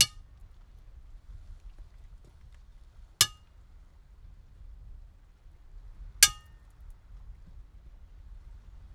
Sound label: 3 hits iron bars outside broch rec in entrance